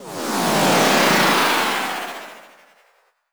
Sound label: Laser FX; Dance; SFX